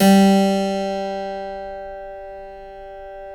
G 2 CLAV; Keyboards Acoustic; AKAI; USB Soundscan vol.17

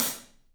PAISTE HAT, Drums; 3D Sound

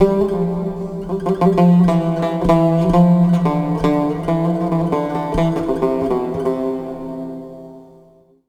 SIERRA GUI; Best Service Advanced Media Trax Building Blocks

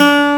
ACGUIT G2, BassGuitar; AKAI S6000 CD-ROM - Volume 1

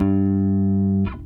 bass 4 (G) 8va longer note; Sonic Foundry (Sony Creative Software); Ugly Remnants VOL-2; Basstuff

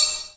BELL JPN, JAPANESE PERCUSSION; AKAI S6000 CD-ROM - Volume 5; Ethic

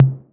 TOMS 808

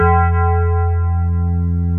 DIGITAL SYNTH; OMI - Universe of Sounds

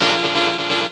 Control Stab; M8

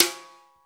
BRUSHES

